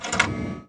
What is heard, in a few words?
mouse multi; Bombardier